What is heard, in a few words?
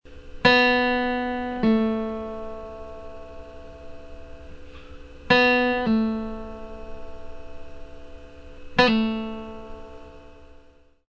pull off, guitar